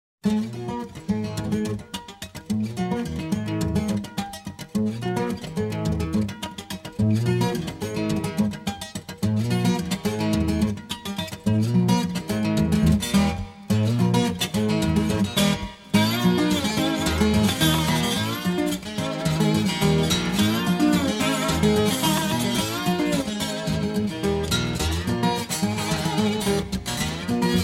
Guitar Music

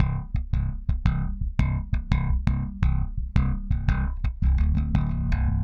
Metal Funk Bass; Rock

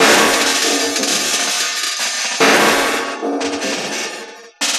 Metal Grind

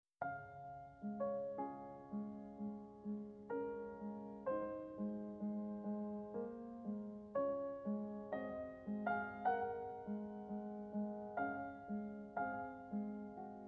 PRELUDE IN D-FLAT MAJOR; Chopin; ''RAINDROP''

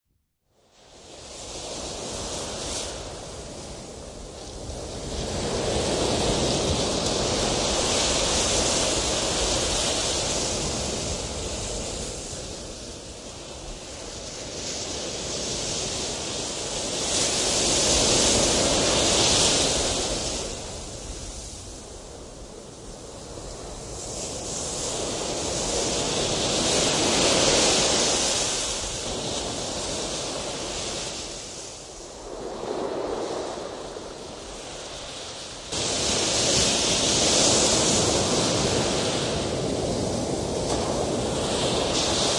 Ocean Surf; Sleep Sounds of Nature

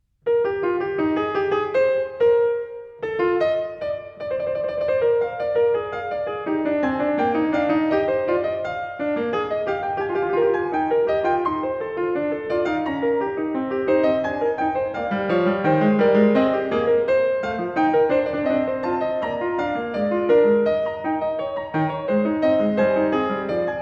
Fugue No. 7 in E-Flat Major, BWV 852; Bach; The Well-Tempered Clavier; Book 1

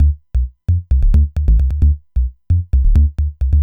bass, dance

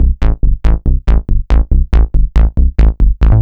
bassline, dance; Basses

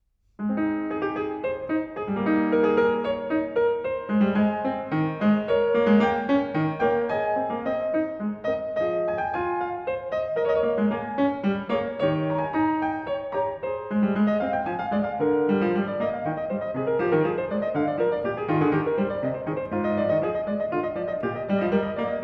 Prelude No. 17 in A-Flat Major, BWV 862; Bach; The Well-Tempered Clavier; Book 1

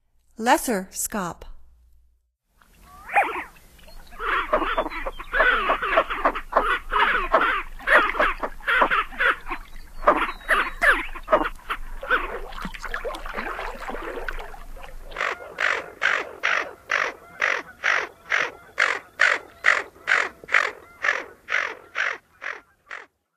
Lesser Scaup; N. America; Songs of Birds of North America; Stokes

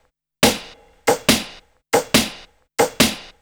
Ensnare Loop, Dance, Beats

